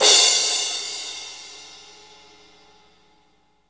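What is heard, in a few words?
CYM CRA, cymbal crash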